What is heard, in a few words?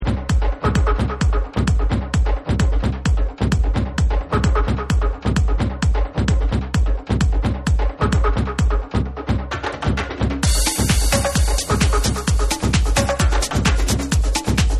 Tribal Remix